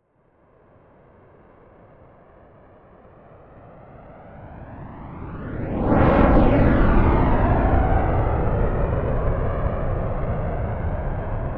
military jet rafale flyby right to left fast distant